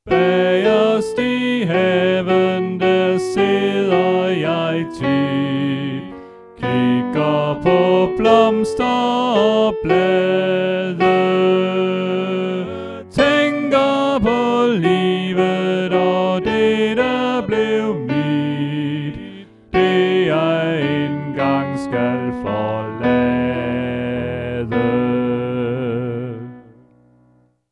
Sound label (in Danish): Sopran; oevefiler